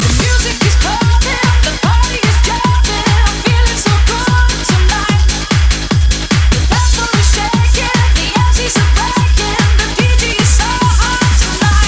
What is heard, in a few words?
Music Is Pumping